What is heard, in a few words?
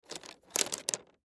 Lighter Pick Up Rocks; Lighters; Blastwave; Foley